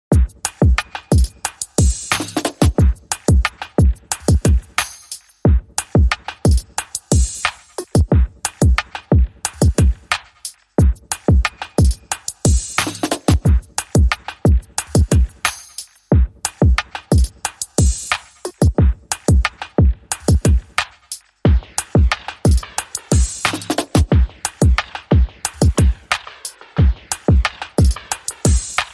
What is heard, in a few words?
Drums Only